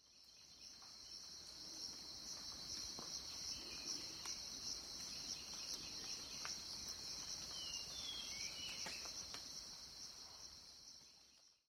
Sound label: jungle sounds